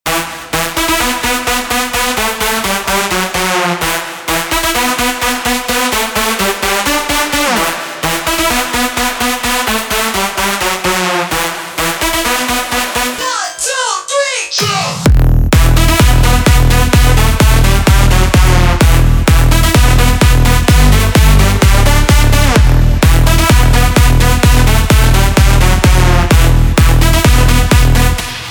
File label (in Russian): krutoy rington na telefon